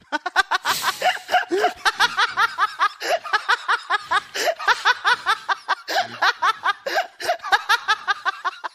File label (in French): rire